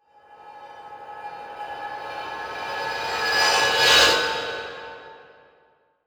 Transition Reverse Warble Reverse; Production Element

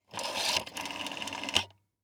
Household Phone Dial Rotary Seven

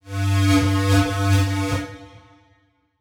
Title Transition Power Source; Production Element

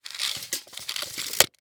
Industry Tape Measure Pull Back Quickly

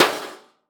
Impact Sheet Metal Impact Hammer Hit Car Part

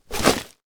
Foley Wood Rattle Shake

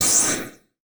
Multimedia Internet CD-Rom Flash Whoosh Short

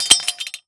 Weapon Broad Sword Drop On Stone; AdobeCC

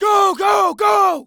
Human Male Yells And Cheers Go Multiple

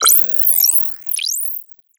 Digital; Adobe; Multimedia Internet CD-Rom Flash

